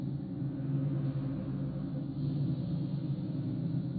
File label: reel general; netent